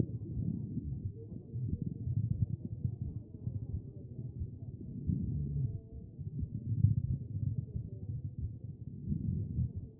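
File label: anterior chest, position 4; B MODE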